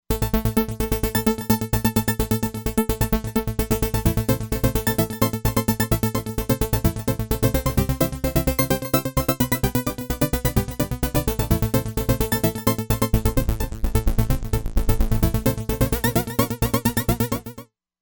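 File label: Sequence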